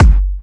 Kik; Rawcutz - Nu Forms

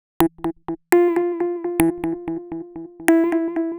Minimal A, Synths, Underground UK House & Electro; Sonic Foundry (Sony Creative Software)

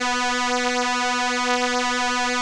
ELKA, String Machines; ELKA RHAP

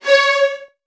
C#2, Strings, VN 16TH; ILIO; Kirk Hunter

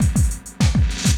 loop, 200 BPM; Ueberschall; Drum & Bass Resonance

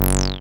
TB G2; Best Service Gigapack 1; SYNTB303

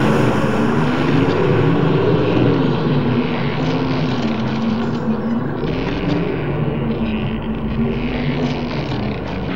stormstack; Sonic Foundry (Sony Creative Software); Ugly Remnants VOL-2; UR 2 FX (Long)

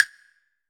block; Greytsounds; Scott Peers Sound Engineering

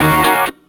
deft, hits; RoBKTA Sample Pack Supreme

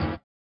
SWUSH; Spectrasonics; Metamorphosis

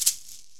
PERC & KALIMBA

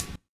Dance Drums

